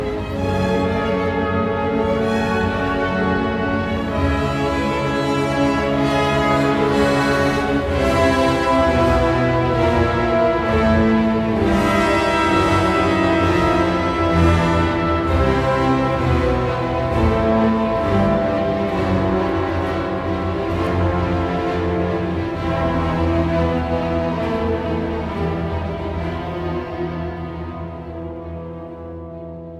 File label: Bruckner 9th 4th Movement Chorale Dissonant Conclusion; Rattle